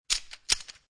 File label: Poker Chips Rattle Chips In Hand; Gambling; Casinos